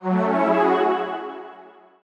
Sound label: Soft End Game Over